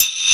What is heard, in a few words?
vish, Swish